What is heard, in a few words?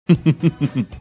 quietly chuckling